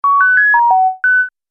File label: Multimedia Music